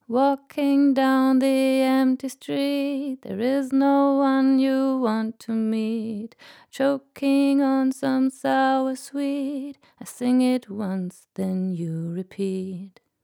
Vocal ohne Comp.Two

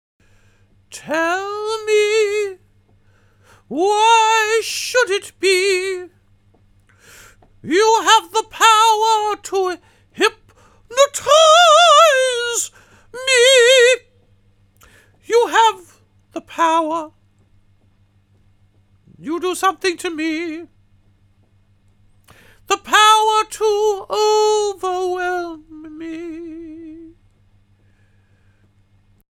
Gesang trocken

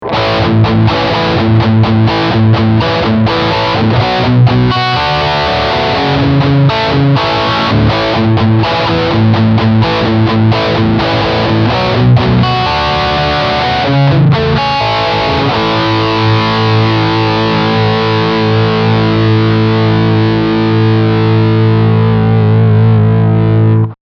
Verzerrer; ICON I

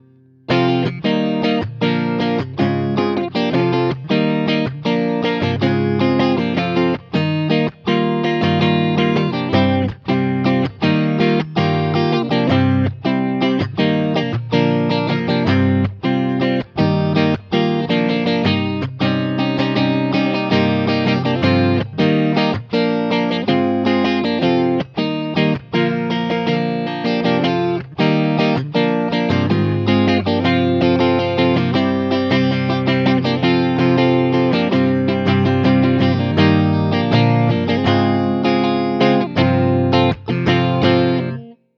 Clean beide PU

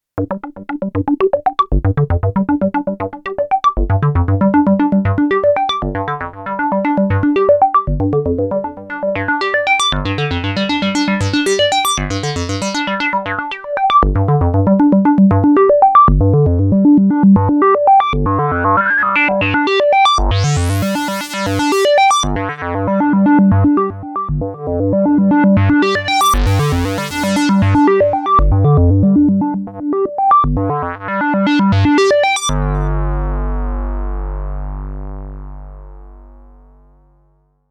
Pling Sequenz

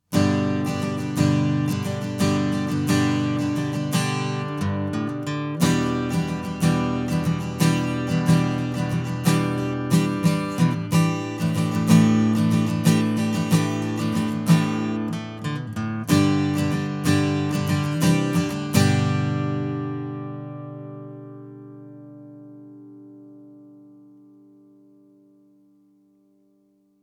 Shure SM57 Akustikgitarre

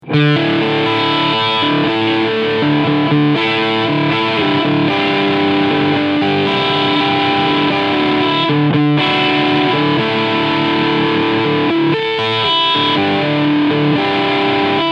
Fender AV II 75 TELE DELUXE Overdrive beide PUs

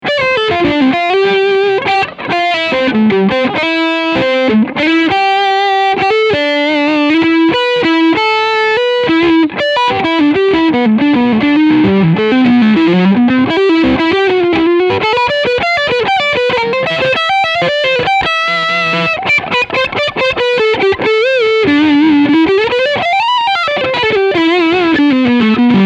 Crunch beide PUs

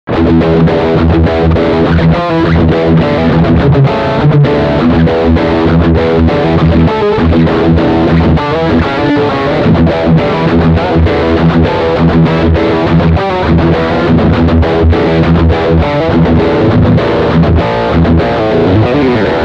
Heavybrett Steghumbucker

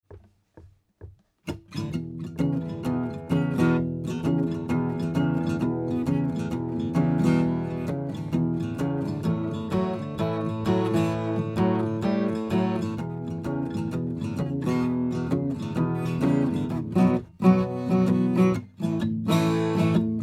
12 string dry mit Trittschall; Drawmer; Bounce Pan LR

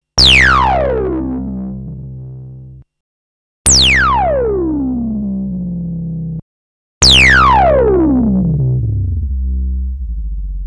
vergleich saw reso

